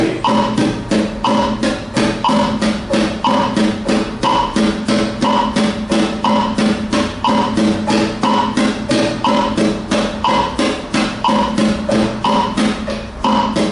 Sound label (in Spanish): Rasgueo, guitar